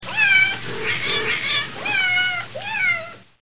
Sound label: cats meow